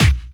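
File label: snare drum bass drum, Dance Mega Drums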